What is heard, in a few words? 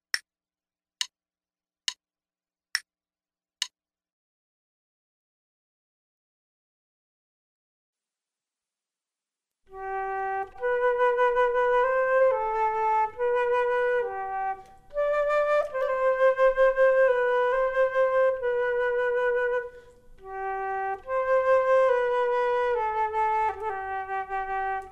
Duets flute; Selected Duets for Flute Podcast